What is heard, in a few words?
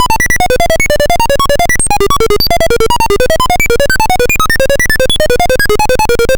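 Apple II FX Thinking Computer; 8-Bit; Chiptune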